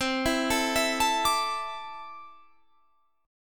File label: C 6th Add 9th C down Guitar Standard; arpeggiated; JGuitar